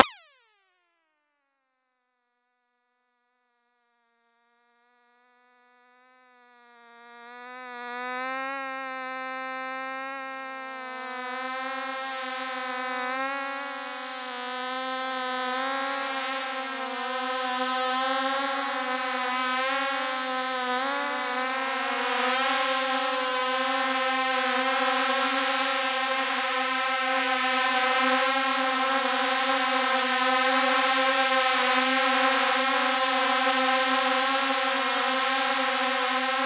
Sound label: insects clusterizer; Chamber of Mosquitos; Klang-/Syntheseexperimente